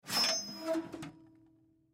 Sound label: Metal Drawer Squeak, Foley Metal Drawer Squeaky Open; Household; Blastwave